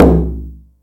low mid tom drum one shot c key, Royality free tom drum sound tuned to the C note.